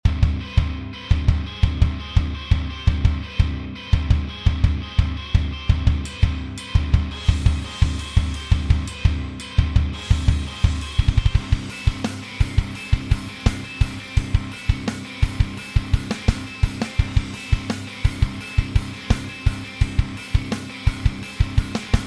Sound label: Metalcore